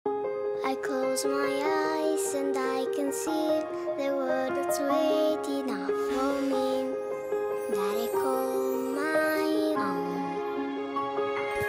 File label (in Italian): voce cantata spot; Natale; barilla; pan di stelle